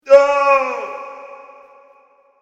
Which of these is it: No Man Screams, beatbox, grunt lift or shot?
No Man Screams